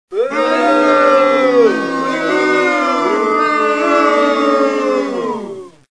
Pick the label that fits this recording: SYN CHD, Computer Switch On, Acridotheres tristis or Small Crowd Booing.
Small Crowd Booing